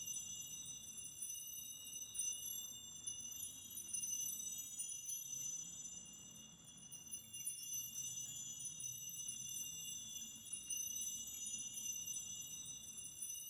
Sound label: Reward; rabbids multiverse